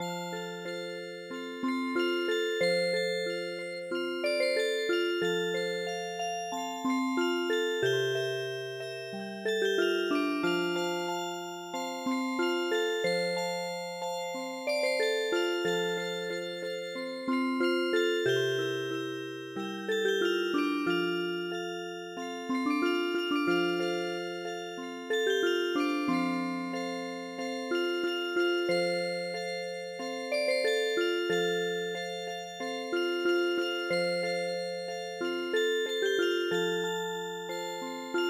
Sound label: Music Box